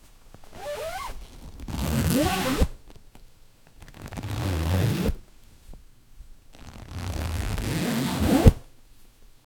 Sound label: heavy fabric rustling zip